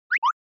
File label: Anime Motion